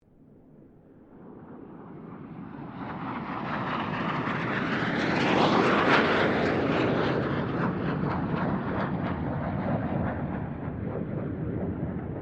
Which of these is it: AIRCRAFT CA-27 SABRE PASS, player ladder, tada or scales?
AIRCRAFT CA-27 SABRE PASS